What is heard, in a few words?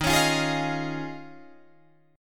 Eb 9th Suspended 4th, Guitar, Drop D